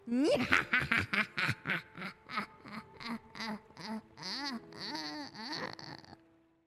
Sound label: cackle